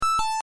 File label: short break